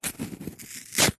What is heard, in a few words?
foley card toilet roll rip small section